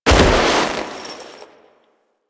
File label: Foley Roll; Warframe; Kahl